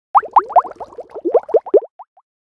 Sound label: Message, Nokia Alerts; Windows Phone apps